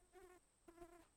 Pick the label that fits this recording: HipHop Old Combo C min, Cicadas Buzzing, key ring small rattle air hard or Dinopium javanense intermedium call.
Cicadas Buzzing